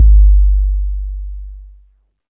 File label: big room bass drum single shot g key; kick drum samples